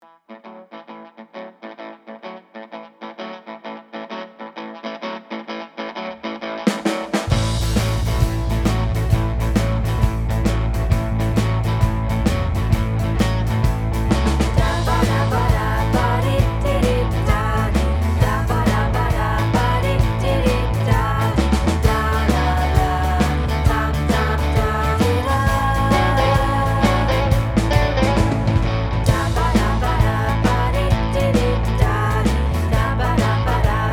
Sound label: Rock Pop Combo Mixdown